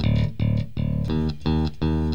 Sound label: bass